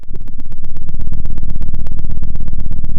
pop, Sound of an engine revving

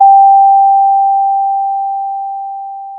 Sound of a school bell

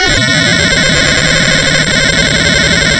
pop, tearing paper

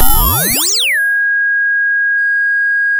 sound of a bike bell

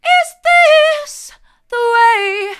hard, vocal

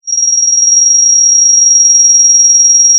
pop, Sound of a phone ringing

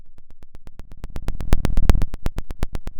pop, Sound of a fire crackling

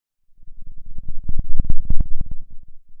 Sound of a thunderstorm, center

